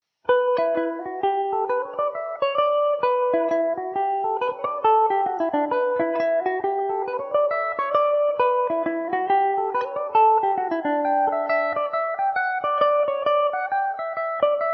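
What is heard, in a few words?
E dorian, Irish mandolin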